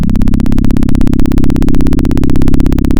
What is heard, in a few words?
Sound of a plane taking off